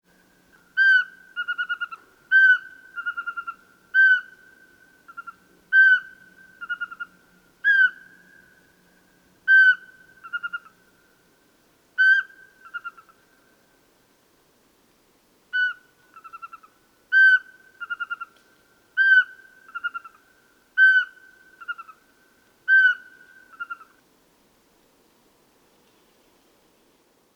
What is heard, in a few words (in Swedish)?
Sparvuggla